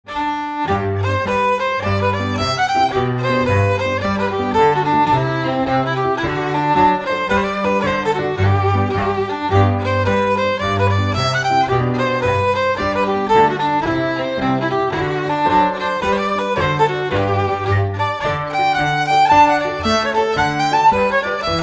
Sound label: Set Of Jigs